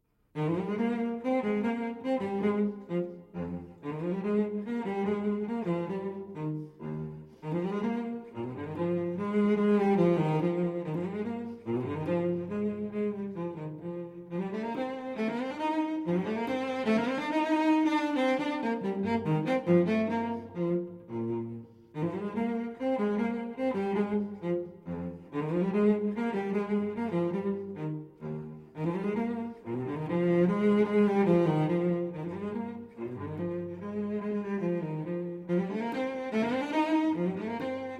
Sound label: Cello Suite No. 4 in E-Flat Major Bourrées I & II; BWV 1010